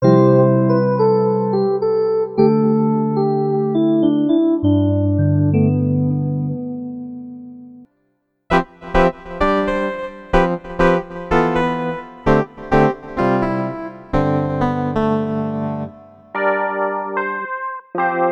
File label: Keys